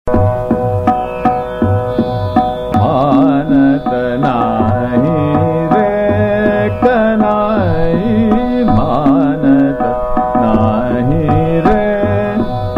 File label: Chota Khyal - Trital, Bageshree; Manat Nahi Re